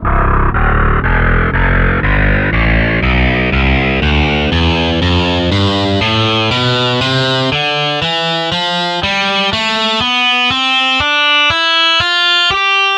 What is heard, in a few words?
distortion guitar tonleiter